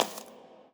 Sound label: fs tile, footsteps; Portal 2